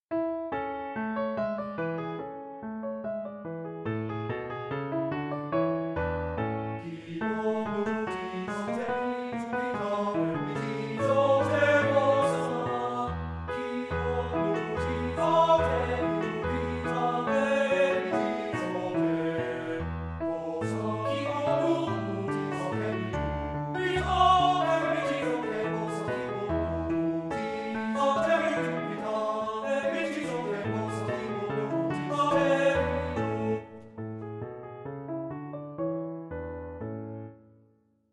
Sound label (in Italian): Lied per Canto e Pianoforte Allegretto; Der gluckliche Bauer